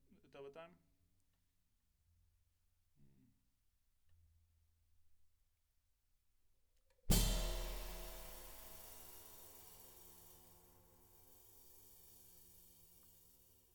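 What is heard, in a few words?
Snare Top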